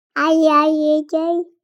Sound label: BABIES TALK Boy 3 months Iyahyeyyay; Baby Boom